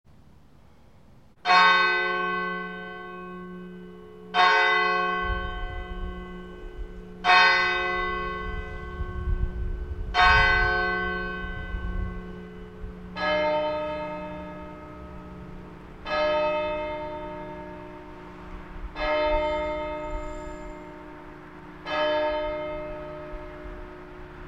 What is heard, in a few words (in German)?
Glockenschlag, Abendläuten; gemeinde; Hosianna I